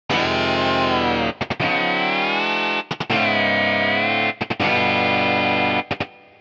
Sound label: Bend